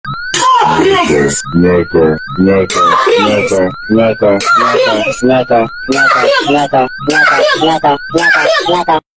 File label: charging up